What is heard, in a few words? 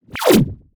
tap; GadGame